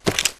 ammo pickup; Titanfall 2

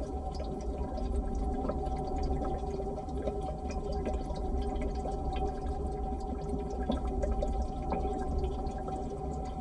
robot cylinder; Titanfall 2

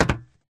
Grunt Mvmt FS Walk Solid Metal; Titanfall 2

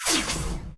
bullet generic titan shield scatter; Titanfall 2

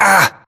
grunt hits; Titanfall 2

